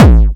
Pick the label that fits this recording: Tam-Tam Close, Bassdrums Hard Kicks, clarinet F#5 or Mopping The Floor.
Bassdrums Hard Kicks